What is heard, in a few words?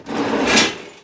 metal noise, Cartoon Film Effects